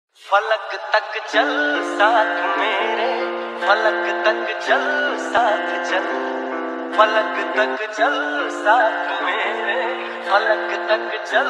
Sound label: lofi slowed reverb new hindi ringtone